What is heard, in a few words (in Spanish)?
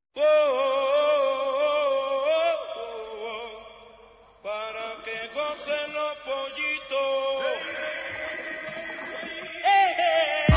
piano negro